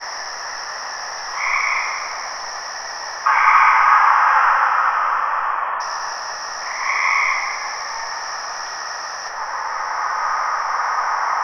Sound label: T-Rex Roars; Valley of the Apes